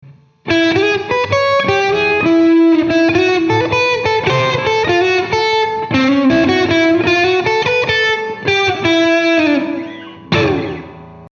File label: lick solo